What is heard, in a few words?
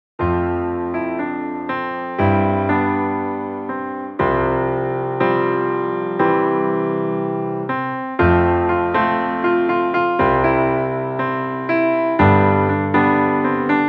Piano Instrumental